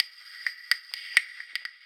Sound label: FX Loop 128 BPM, House; Best Service; House Definition VOL-1